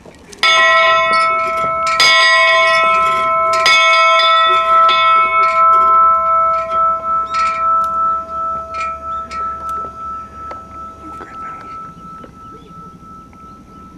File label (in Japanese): kibou no kane